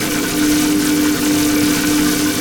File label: Sci-fi Electricity Hum Loop; SFX Kit; Sci-Fi Loops